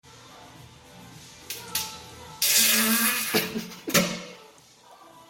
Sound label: Toilet air fart